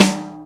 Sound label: Snare, Percussion Hits, Snares; Jungle; Zero-G Jungle Warfare 1, 2 & 3; 90 s Sample CDs